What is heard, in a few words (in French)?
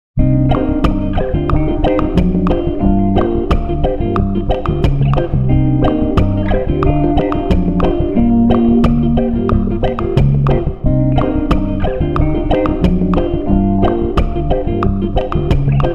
Boucle; lanfeust